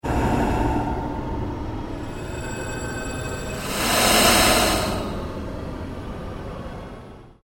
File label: PULSE LONG DOUBLE SWEEP; Sound effects created by manipulating other sounds; Sweepers; Sound Design; Foundation4